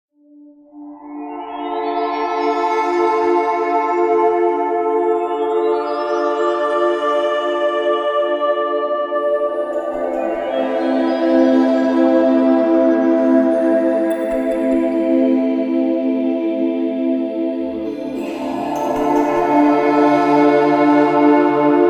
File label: korg wavestation test